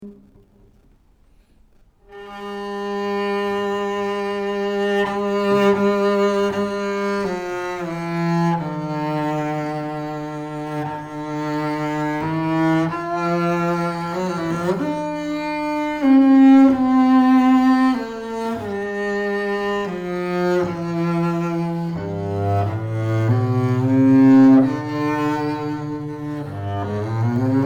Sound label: antique double bass sound sample; blonde double bass